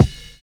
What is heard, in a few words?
SNAP; Univers Sons - Kitch 70s